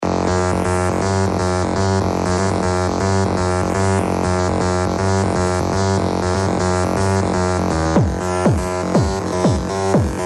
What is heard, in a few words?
tune